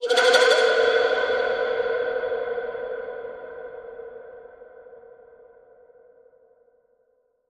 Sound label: Wormhole Flutter Spin